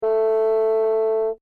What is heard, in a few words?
bassoon; orchestration